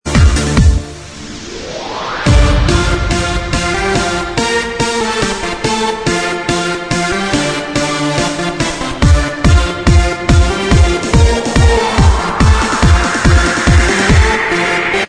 Inst

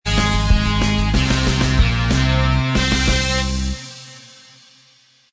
theme Inst Track

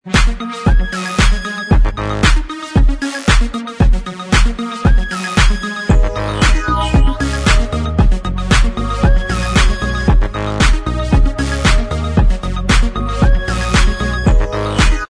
Inst Track

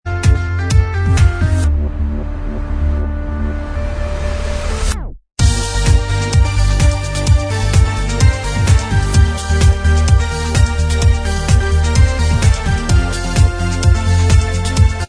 Inst Track

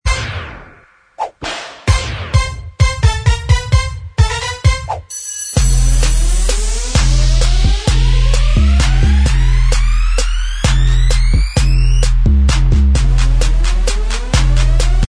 Inst Track

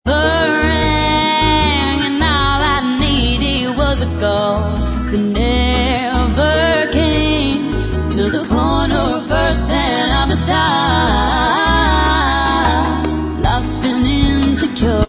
bossa style backing vox

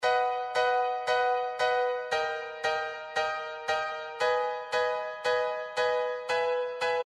B Vox

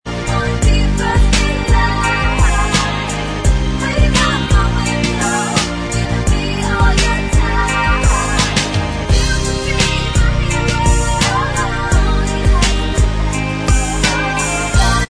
Bvox

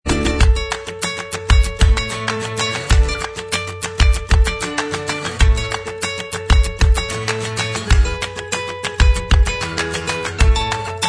Inst Track

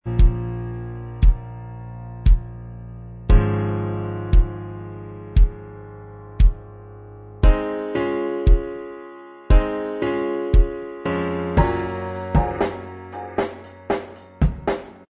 Inst Track

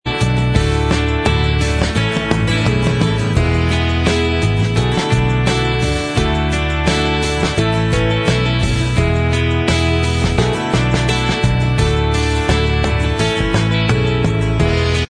in style of Inst Track

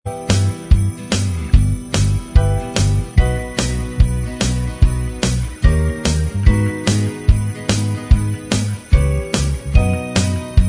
Inst

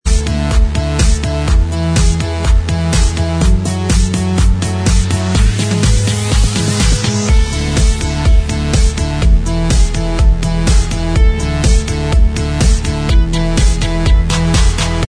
Inst Track

